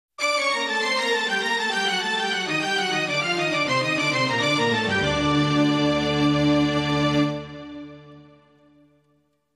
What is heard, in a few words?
Bhangra Beat